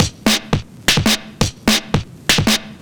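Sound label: Drum and Bass Beat 170, drumnbass 170bpm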